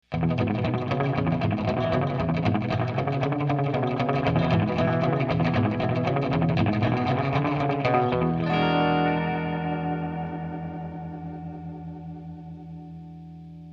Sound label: EP Analog; tonecore